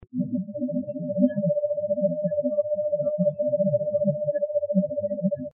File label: first Trill, owls